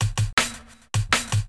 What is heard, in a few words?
drum loop D+B 160; eJay